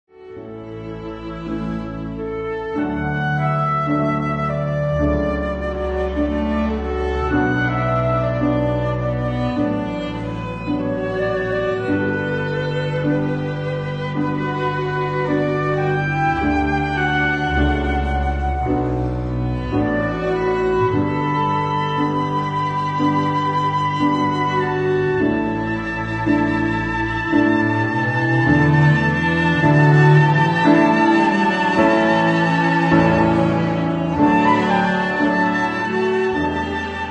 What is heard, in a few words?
oboe